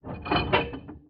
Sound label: damage vehicle; World Of Warships